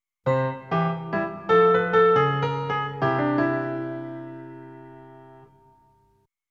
Game Over; NINTENDO

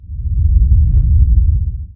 small quake, Mines; AMB; OneShot Fading Memory

